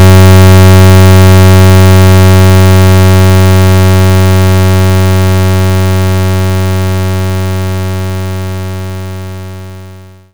Pulse 93 75Hz

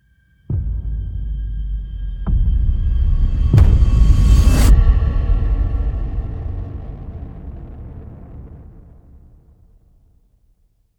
Outro Stinger; bramble the mountain king; ps4 ps5 switch windows xbox one xbox series xs

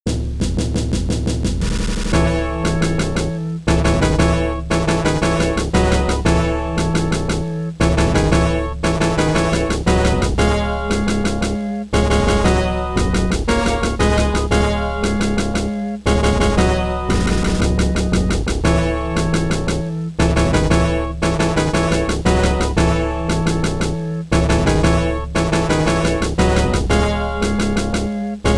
Scenario Clear; Lightning Vaccus; x68000

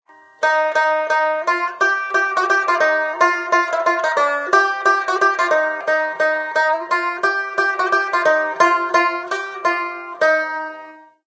Banjo; out from boneville; Old Gray Mare

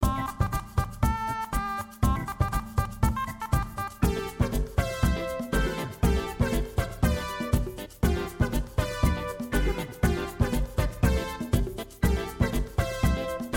Muzak

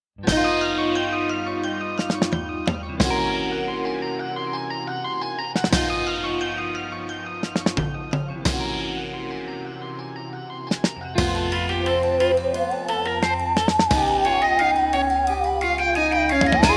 In-Game Music

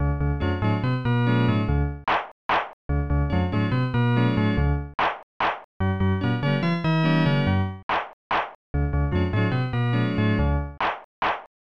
Organ Music

